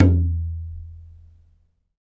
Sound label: Talk Drums; Spectrasonics; Supreme Beats; African Contemporary